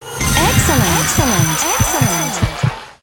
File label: megawin; Gem Star